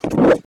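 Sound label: rubber, strange effects; STRANGEFX; Total Control - Action Cutz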